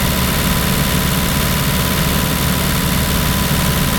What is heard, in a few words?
Nissan Terrano II 2.7 TDi Engine - Designed - 2k RPM loop; mono; Soundholder